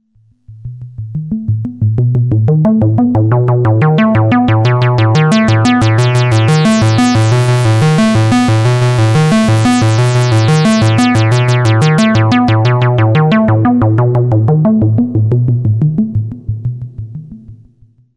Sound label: Puls Sweep, texture